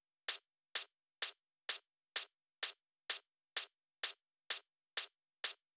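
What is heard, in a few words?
closed hi-hat, Drum Loop 128BPM; Phalanx8; VEC5 Drumloops; VEC5 Multi Loops 128BPM; Loop Elements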